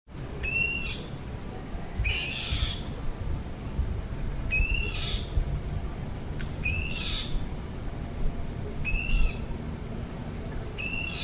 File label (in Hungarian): nagy pele éjjel; allathangok; termeszetben; abaliget; zoom